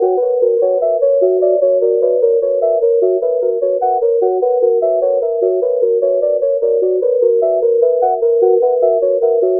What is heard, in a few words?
Flutey Low Cycle, Synthetic Pulses